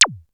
Zap, Drums; ZERO G - Datafile DISC 3

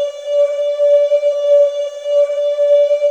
HIMONIOUS, HARMONIOUS; Spectrasonic Distorted Reality 1

